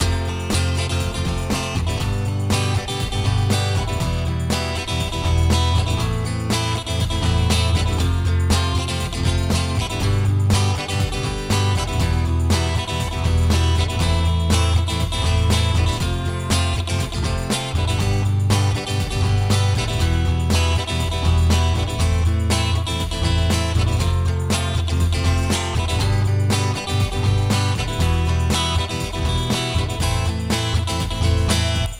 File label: middle E acoustic